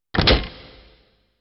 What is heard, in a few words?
box open Treasure Box is Opened Up; dot hack